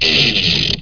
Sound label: CLEAVER; JOHNNY